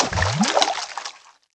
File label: DRAW LIQUID